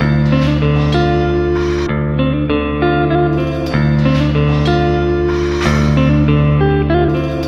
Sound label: guitar, Loops, Sad